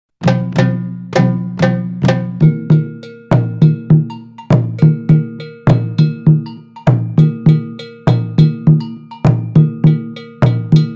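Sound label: Dundun, Agogo, Djembe, Shaker, Clave; Trad. Ghana; Timelines: 2 / 3 Clave, One Bar Clave; Kpacha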